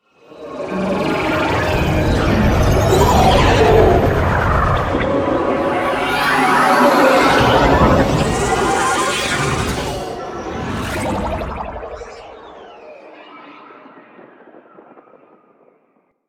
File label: Insect Liset Mountain Pass Drop Off; Liset Flight